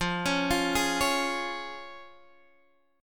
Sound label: F Minor Sharp 5th, Guitar, Drop D; x,8,11,10,9,9